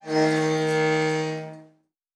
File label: Metal Foley Creak; Sci-Fi Sounds